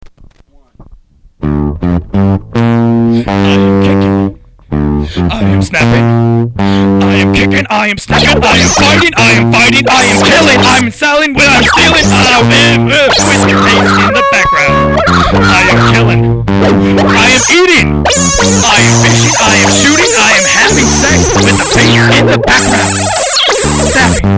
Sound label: Bass in the Background